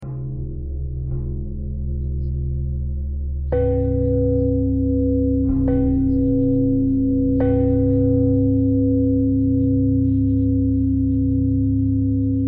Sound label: bell thread pentatonic